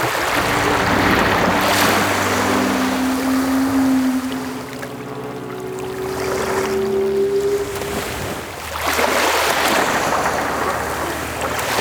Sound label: Pirate Kraken Ocean Loop; Warframe